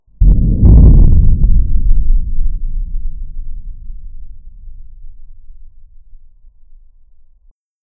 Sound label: earthquake loud; volcan